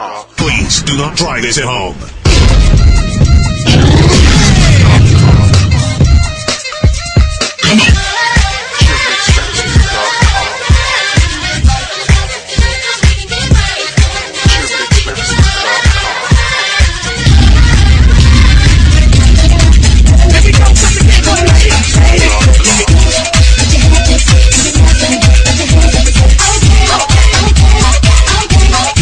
cheer remix

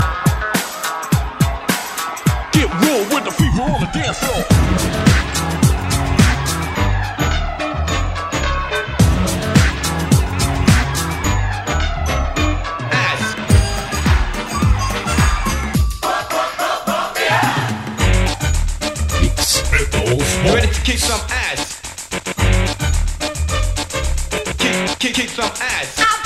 dance mix 90s

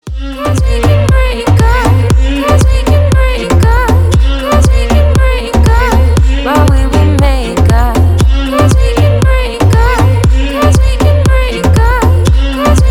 techno

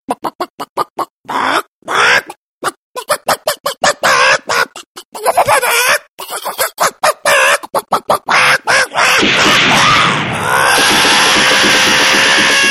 funny chicken

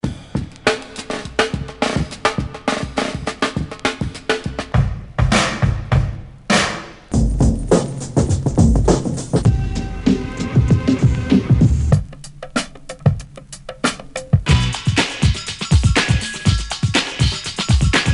old school mix

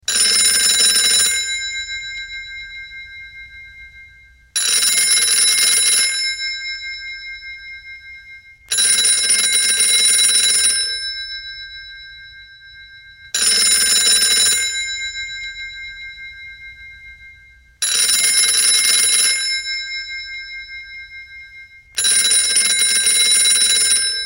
calling ring

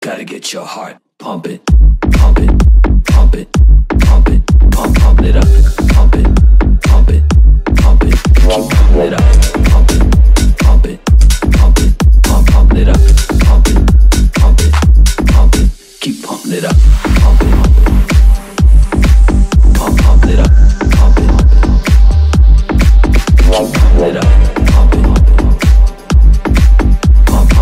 bass boosted

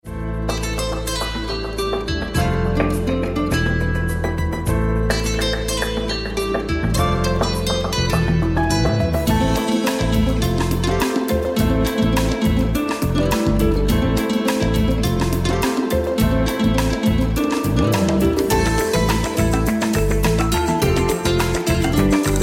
lovely guitar

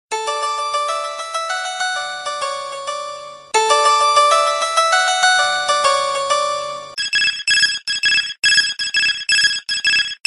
Samsung galaxy s4 clock alarm

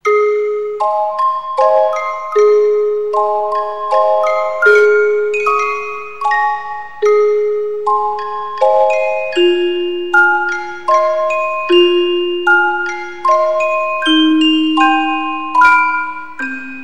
cute bells

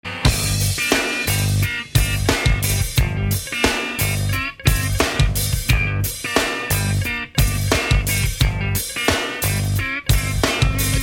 amazing guitar intro

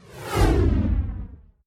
fast kick

